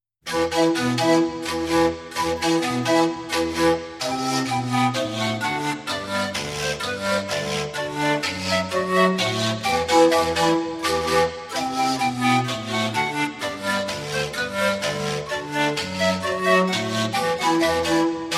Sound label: Andean Pan Pipes, Aleluya